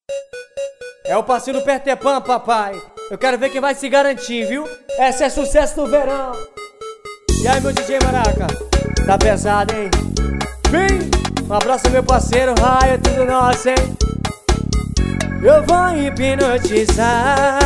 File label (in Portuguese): passinho